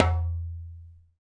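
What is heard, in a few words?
djembe, African Perc; Spectrasonics; Supreme Beats; African Contemporary; SB Afro DRMs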